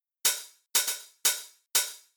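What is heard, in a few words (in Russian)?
open hat, Ритмическая фигура открытого «хэта» 2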